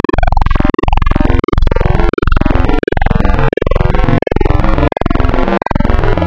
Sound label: Spectral, wavetables; Architecture 32